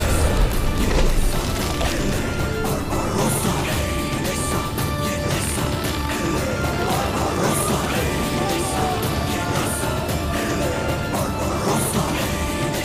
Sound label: Turkey Ringtone